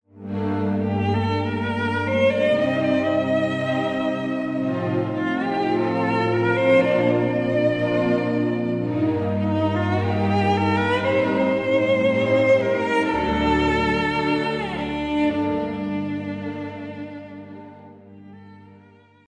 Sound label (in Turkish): SANTRAL MÜZİK